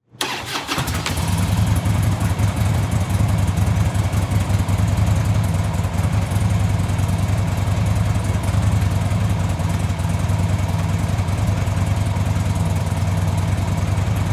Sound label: Motorcycle Start On Idle Off